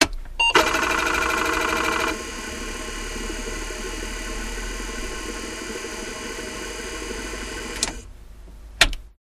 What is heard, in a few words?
Computer Switch Beep; beeps & tones